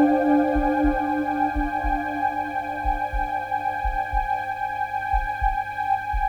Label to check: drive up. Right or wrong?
Wrong